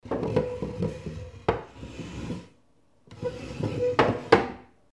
am thanh tieng keo ban ghe

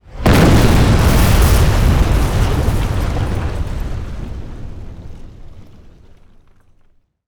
am thanh phun trao dung nham nui lua

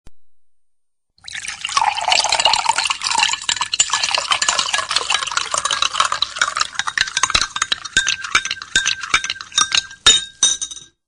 tieng rot nuoc va khuay danh

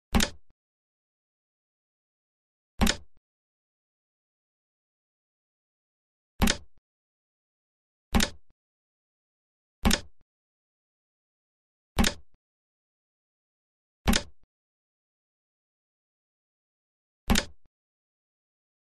am thanh tieng danh co tuong

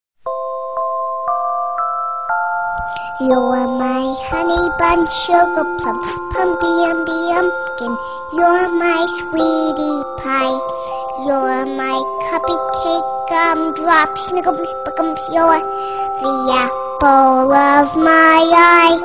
nhac chuong de thuong baby

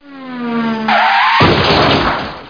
tieng dua xe roi va cham

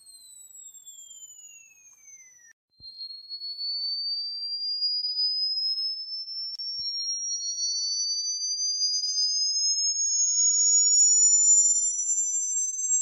am thanh duoi chuot

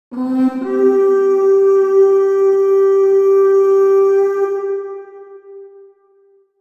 tieng thoi ken chien tranh co dai

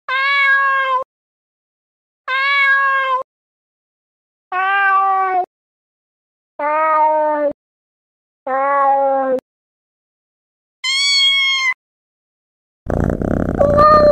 tieng meo keu goi duc

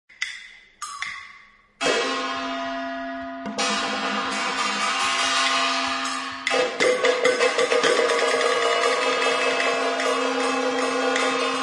am thanh tieng chieng trong ron rang trong man khai mac; nhac dao su kien lam show dao dam cuoi

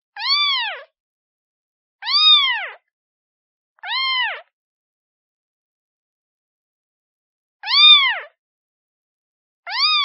tiếng mèo con kêu tìm mẹ

